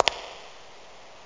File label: foot tech; alienbreed3d2